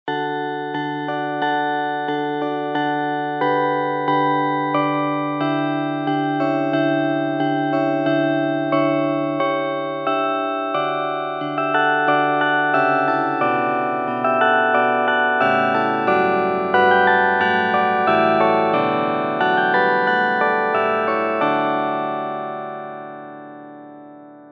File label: Harp; Flemish with Harp Bells